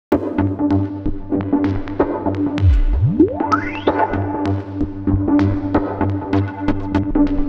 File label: 128 Gm Arp; Galaxy